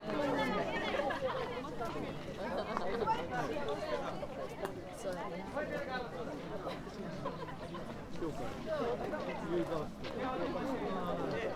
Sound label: hanamiyama mountain top; Mountaintop of Mt. Hanami; Many kinds of flowers in Mt. Hanami fully blossomed; Koji Nagahata